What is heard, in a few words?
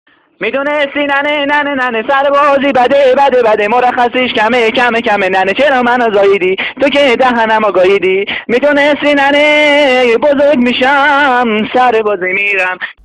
persian middle eastern singing